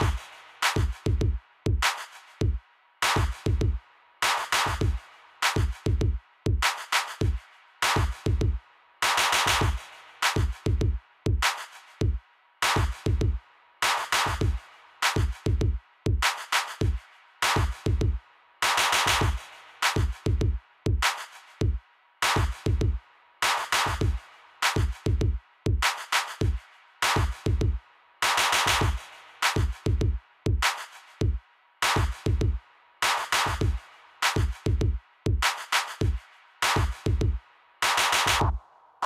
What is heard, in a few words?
Tension Curve